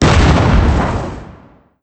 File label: rocket fire; cyberdemon